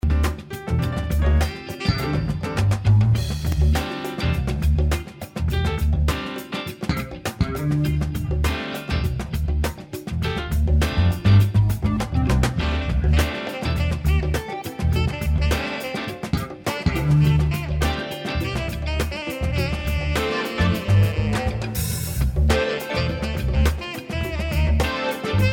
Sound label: Bass